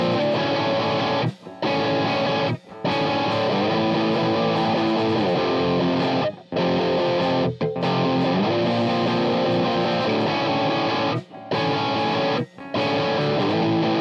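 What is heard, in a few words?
guitar solo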